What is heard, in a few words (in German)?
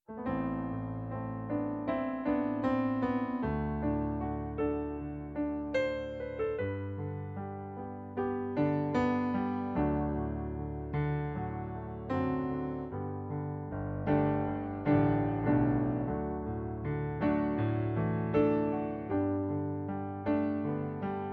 Pianoplayback G Dur